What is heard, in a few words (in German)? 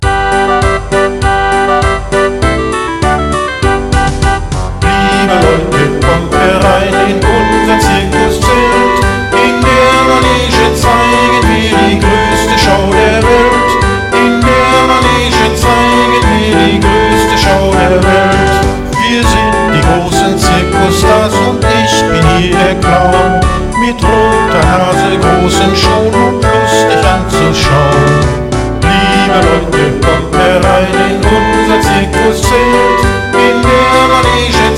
zirkuslieder strofenlied